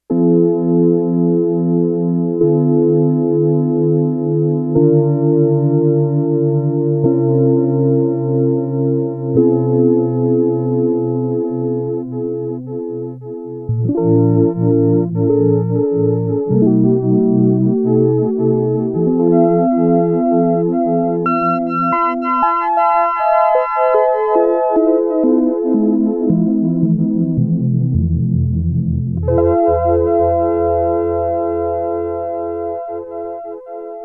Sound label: Pulse Width Mod